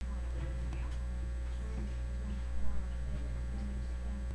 jungle

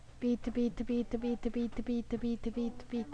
beat

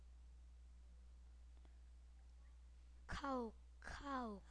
cow

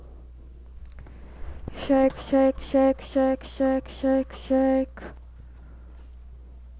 shake

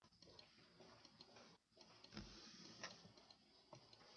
frog

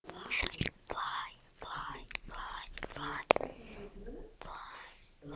fry

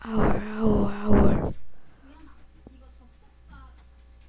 owl

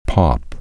pop

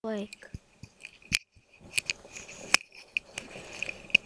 rake

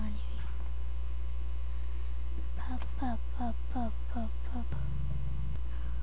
pop

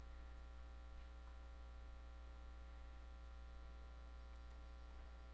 metal

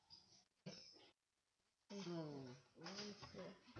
whistle

